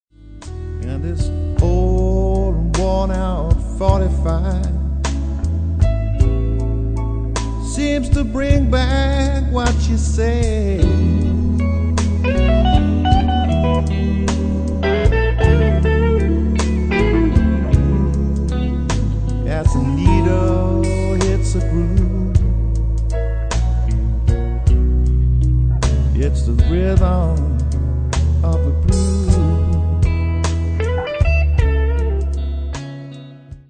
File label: Rhythm